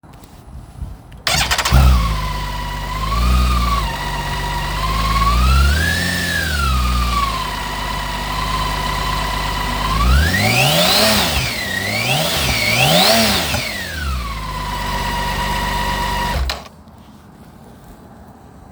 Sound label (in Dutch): Motorfiets Geluid BMW K1600B; Rillaar (Vlaams Brabant)